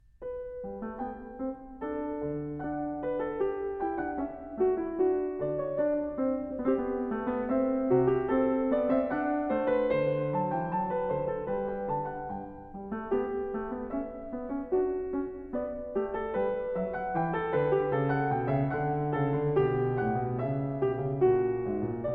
Goldberg Variations, BWV 988 (Piano) Variatio 18 a 1 Clav. Canone alla Sexta; J.S. Bach